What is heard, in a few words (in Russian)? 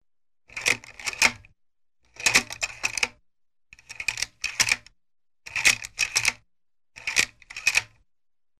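диапроектор фильмоскоп смена слайдов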